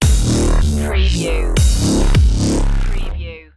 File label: bass tricks stinger